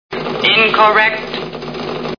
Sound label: computer incorrect; Star Trek